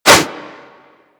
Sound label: Whoosh, Weapons; Warframe; Furax